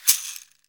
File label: CAXIXI, AFRICAN PERC; Greytsounds VOL-1